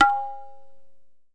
na, Tabla; Tabla Kit Small

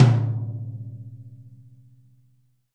thin tom, Multisampled Real Kit; REAL LIVE KITS

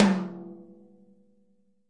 thin tom, Multisampled Real Kit

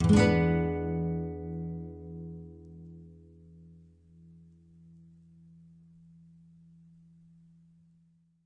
Nylon Chord E7; Nylon Guitar Chords

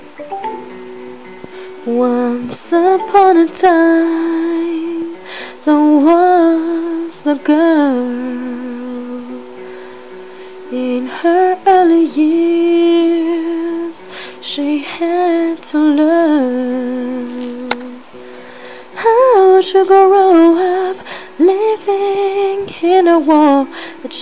once upon a time, VOCAL ACAPELLAS

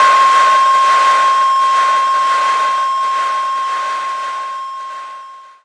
pure distortion; Various Strange Sounds and Effects

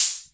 Hammond Drum Machine hho

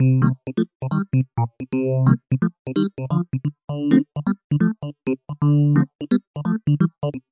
130 electric piano Cmin Dmin; KEYS ORGAN PIANO LOOPS

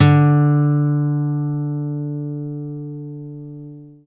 6 String Steel Guitar D2; six string steel guitar

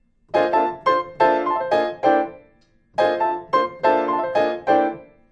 chill piano 90 Gm7 C7alt; KEYS ORGAN PIANO; MELODIC SAMPLES

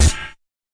fx drum, Electro FX

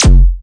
Syn Dr, Overloaded Synthetic Drums; Hip Hop; style kits

